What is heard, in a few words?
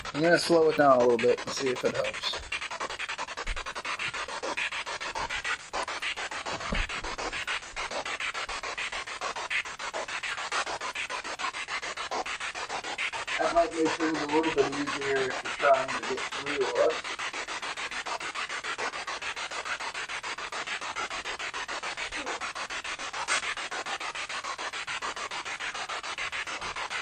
Canopy Room Spirit Box